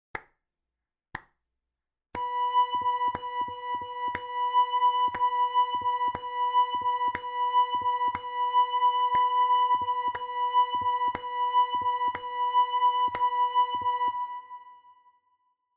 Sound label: Rhythm Practice with the B Note